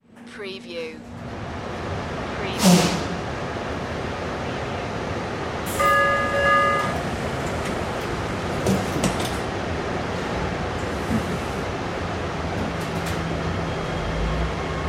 Subway Train Interior, Various Calls, Stops And Starts